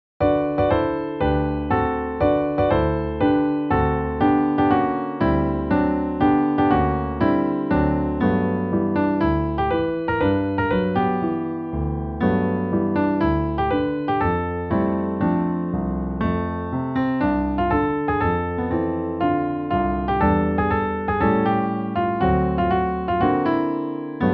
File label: Accompaniment